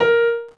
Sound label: PIANO